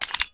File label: m9 mag out